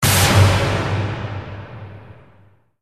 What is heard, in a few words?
Scare